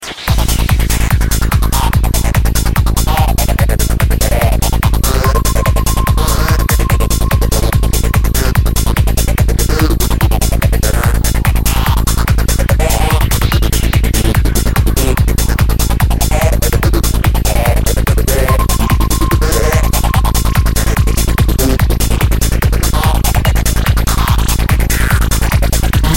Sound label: Techno Mix